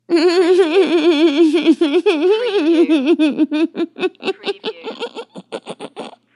Fem Annoying Laugh

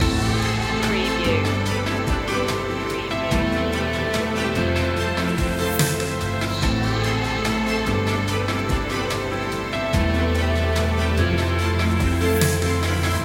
reaching a goal loop

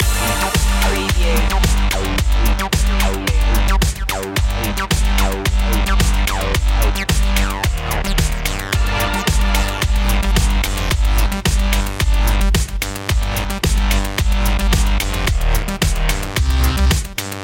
french punk loop

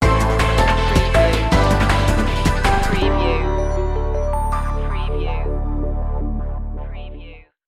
fast progress stinger

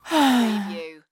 Sigh Female; sound effects bible

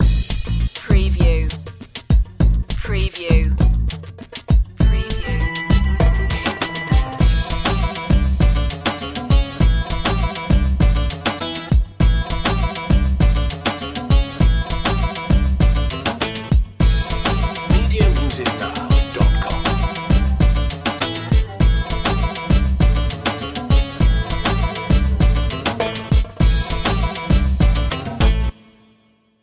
turkish delight

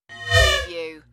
Alarm Hit

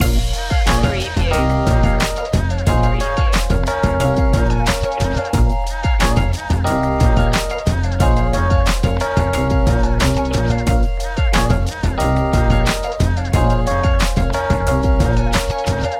hip hop new york loop

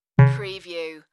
Dong

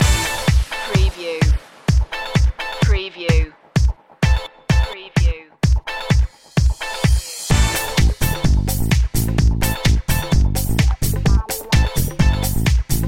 funkee slide

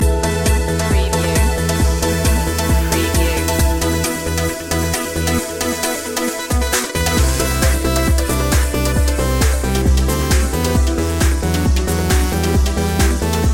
ground pulsation loop

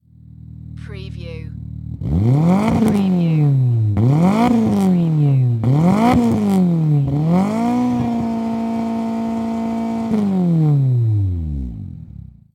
MITSUBISHI ECLIPSE EXHAUST MULTIPLE REVS

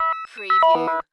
Multimedia Chime

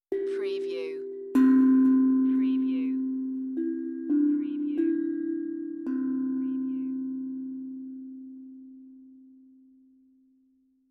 Tongue Drum Meditative Tone; beanstalk audio

